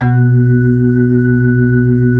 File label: B-3, Blues, Keys of the 60s and 70s